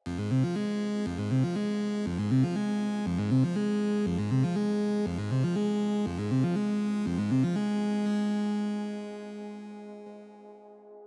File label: Red Fog Analog Soft; Get Even